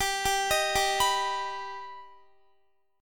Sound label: G Augmented G Guitar; arpeggiated